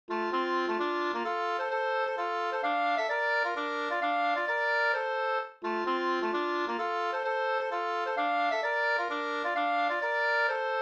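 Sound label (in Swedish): polska, tvåstämmig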